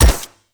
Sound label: Shot Mech, Weapons; Warframe; Akarius Prime